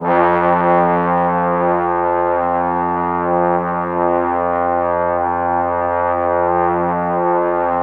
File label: trombones F3; Q Up Arts; Denny Jaeger Private Collection